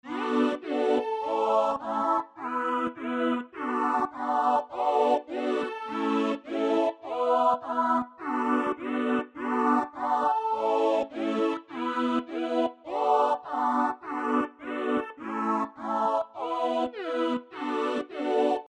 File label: vox pad 103 bpm